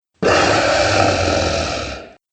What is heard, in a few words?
Hellfire Dragon, monster; horror sound effects